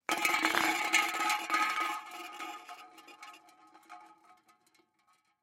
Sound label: Can Roll Hard Aluminum Empty; foley; Objects; Blastwave